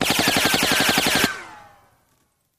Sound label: Machine Gun Fire Rapid Burst With Bullet By Whooshes; single burst